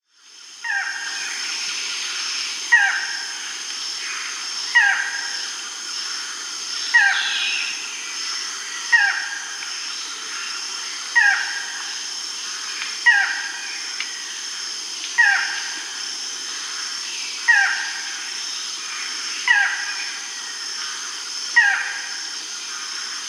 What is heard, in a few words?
Barred Forest-Falcon, Micrastur, Nature's Songs, S. America; Micrastur rufi